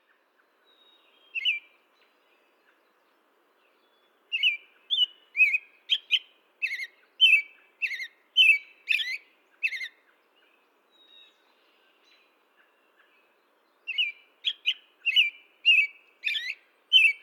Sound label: Turdus migratorius